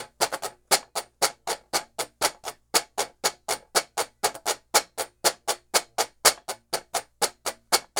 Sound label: Washboard, Appalachian